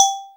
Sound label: Perc, Clavia Micro-Modular